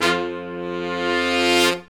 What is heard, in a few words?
brass longs, Brass Sections, Horns